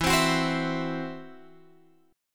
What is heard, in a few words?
E Suspended 2nd Sharp 5th, Guitar, Drop D; 14,15,16,17,17,x